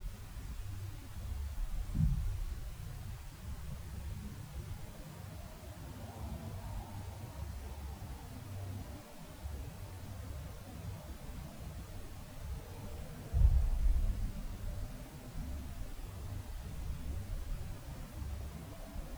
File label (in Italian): interno della torre si sentono due colpi in sucessione non riconducibili a normali scricch; scricchiolii